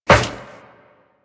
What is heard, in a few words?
Run Metal Perforated, Footsteps; Warframe; Tenno